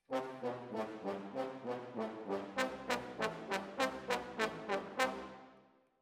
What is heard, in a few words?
Brass Over